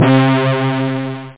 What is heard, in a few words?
syn lead; 8-bit Sampled Voice; 8,363 sample rate; Amiga